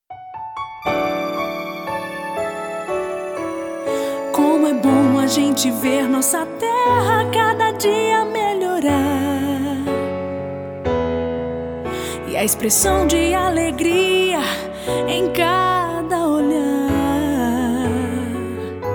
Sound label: jingles